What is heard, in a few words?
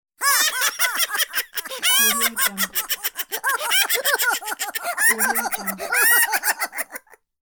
two cartoon characters laughing